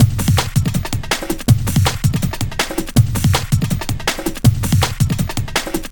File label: Heavy, Loops, breaks; m8 breaks